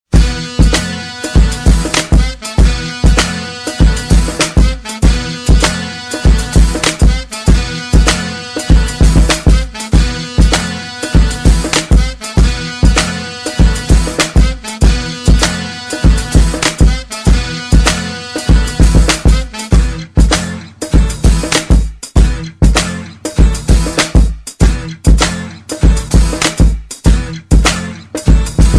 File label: gangsta beat; hip hop